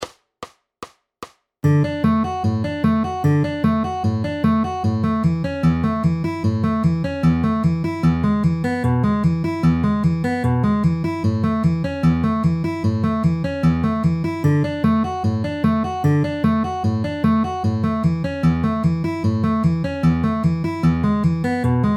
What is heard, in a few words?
D A E A inner outer middle ring with alternating bass at 150 bpm